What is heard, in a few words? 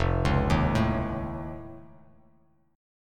F Augmented 7th F, chord; arpeggiated; F+7